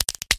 Cracking Fingers

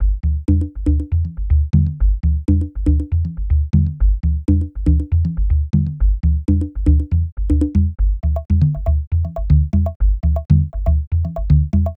Jungle Beat

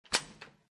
Unlock

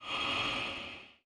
Zoom Chunk; Insanely Twisted Shadow Planet

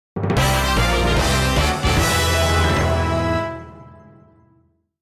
Combat School Fanfare; He Has Been Elevated In Rank; Metal Slug

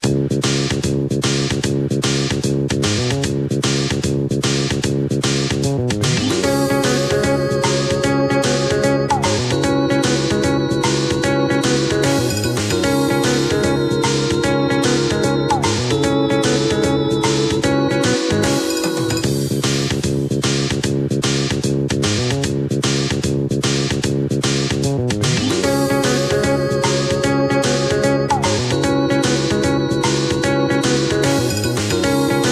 Jingle Rpg Map

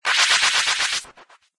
Attack; Yaruo 3; Syobon Musou; Android; mobile

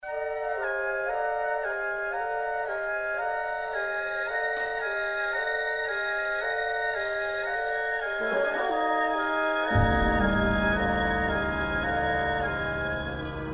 Desert - Ambient

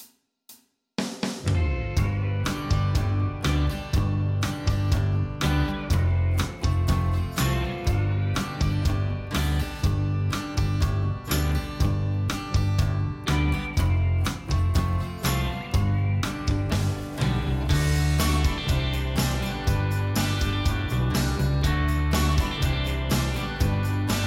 Duet, Karaoke Country; The Sims 4 City Living; macOS; PS4; Windows; Xbox One; Xbox Series X/S

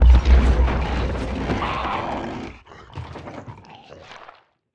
Stinger Basement; silent hill homecoming 2008 gamerip pc ps3 x360; Lurker

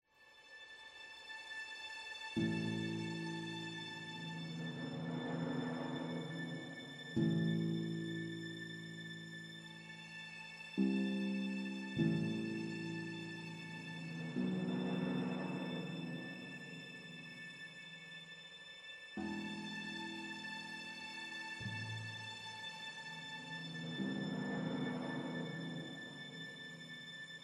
Loop Caution

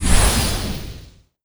Ice Explosion

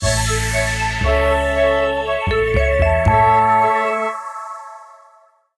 Reward Popup Sting; Doodle Jump Space Chase; Mu ship

